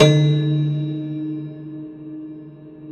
Rubbery Piano, prepared piano

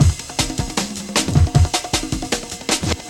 Box Break, Loops; m8 breaks

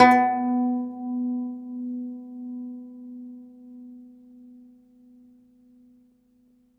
DOMRA, Plucked Strings; Segundo Domra; E-MU Producer Series Vol. 7 – Old World Instruments

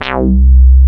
SYN, 168 BPM, Drum & Bass; Ueberschall; Drum & Bass Flash Trackz

